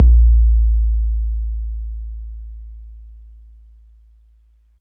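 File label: bass drum 808 MPC60 Click A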